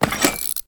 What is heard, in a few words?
Sticky bomb Reload; Grineer; Veilbreaker